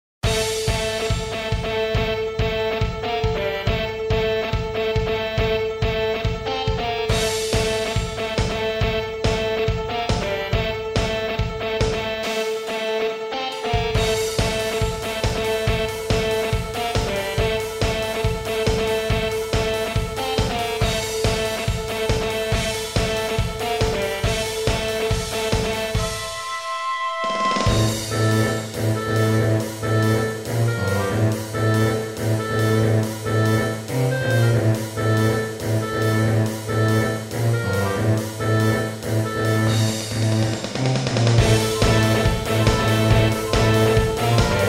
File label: trance